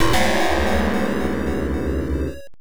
Jingle (Shock); RPG Tsukuru Dante98 II; PC-98

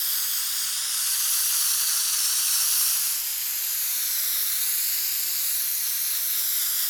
tools spray loop; teardown ps5 windows xbox series xs gamerip 2022

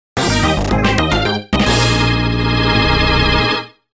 Mission Success - Star Rating; Mario Kart DS

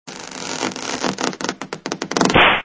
Head Explode

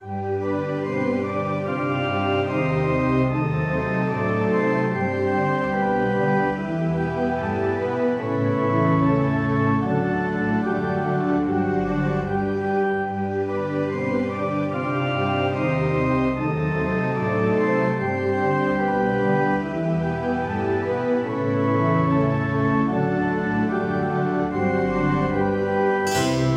Stage Church; petit cluster

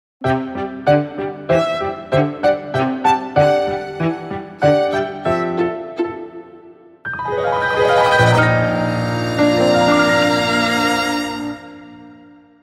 Skill Learning Jingle; Time for More Snacks; Cheshire Skill Learning Jingle 2

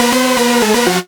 Beat

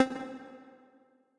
Tessellation Move C; Mixolumia

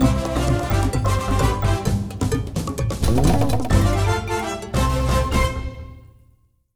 Music Jingle Rescue

